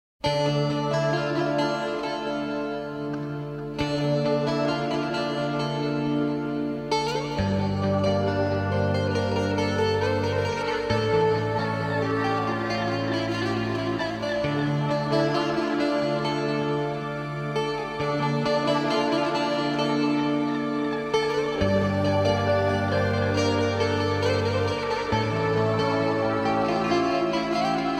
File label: guitar player